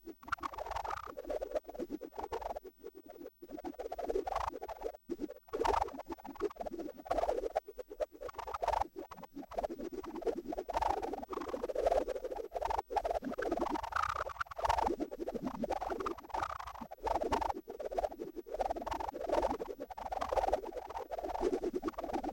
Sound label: Whipper Bug, Vocals - Humanoid; Sony Pictures Sound Effects Series Volumes 1-10; Sony Creative Software